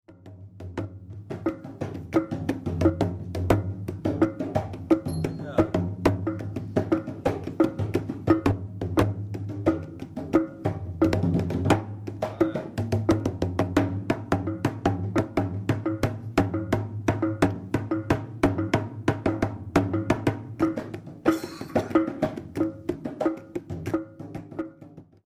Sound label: kendang solo